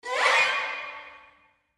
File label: short gliss up